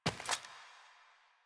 Steps; Arthur; Warframe; Ambience; Nineteen Ninety Nine

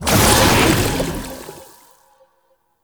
Projection Spawn; Tau; Somatic Bearer

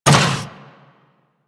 Combined Step Default, Footsteps; Enemies; Warframe

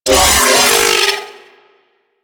Vocal Pain, Enemies; Vocals; Riot Moa

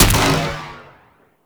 Swarm Cannon Fire; Warframe; Weapons; Corpus

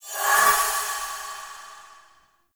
Ward Cast Flock Accent; Powers; Fey; Founders Ward

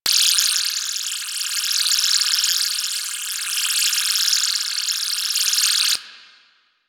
Trooper Link Beam Loop; Warframe; DE; Sentients

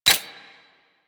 Rifle Reload D; Sundial

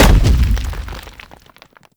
Blunt Heavy Impact Rock; Weapon Impacts; Player; Environment

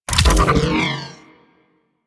Orange Mine Impact; Warframe; Venus; Venus Commander Orange; Abilities

